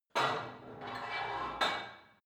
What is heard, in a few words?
put weight onto barbell reverby quick, fitnessstudio